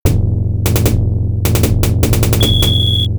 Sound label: Start Music; Ski Kid Dx Version